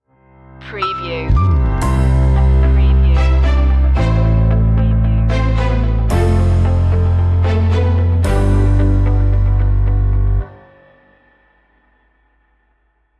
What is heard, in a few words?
happy go lucky stinger